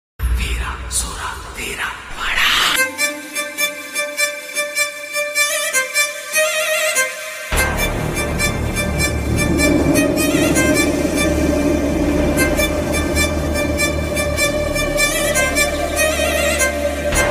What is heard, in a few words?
psyco ringtone tamil telugu ringtone